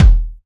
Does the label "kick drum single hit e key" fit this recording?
Yes